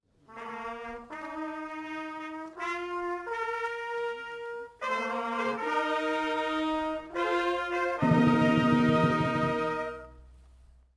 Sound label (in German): Fanfare